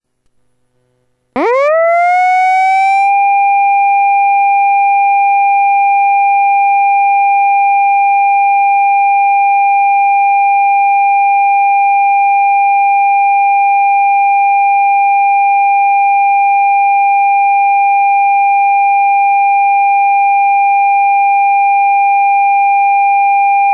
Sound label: sijisiren; bosai; dentatsu